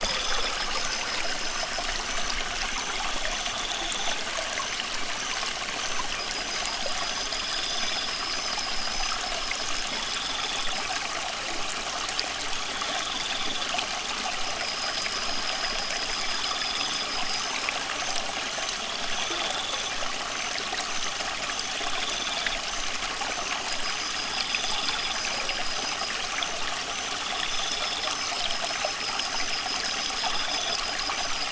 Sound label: Late Night Small Stream